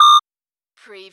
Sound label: Servo Panel Sound - Beep long